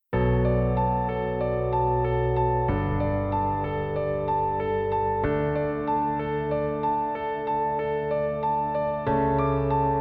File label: melancholic